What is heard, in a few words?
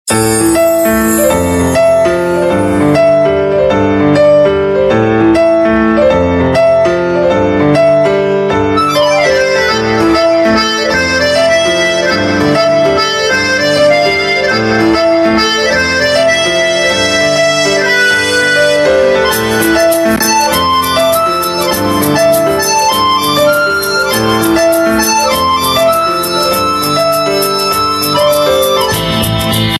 Instrumental Tune; Shaan Rajni BG Tune; TV Serial Tone; Life OK; Bahu Hamari Rajni Kant